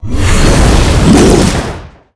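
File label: junior attack, monster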